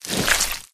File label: human form short move; flood